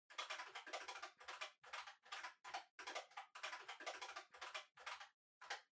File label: Calculator Far Pattern